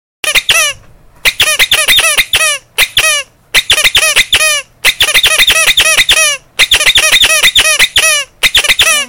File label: Squeaker for stuffed toy